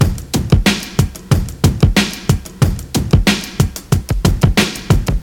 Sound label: 92 bpm modern rap drum groove f key, Free breakbeat sample - kick tuned to the F note.